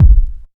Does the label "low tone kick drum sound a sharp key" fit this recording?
Yes